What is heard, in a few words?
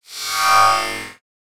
Glitchedtones Transition; Afterbern Democralypse; Windows